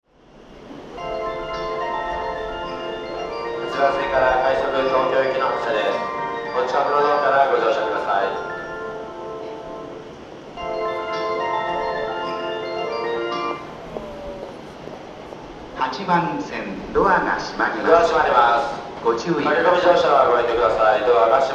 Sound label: shinjuku melody, Tokyo Train Tunes; japan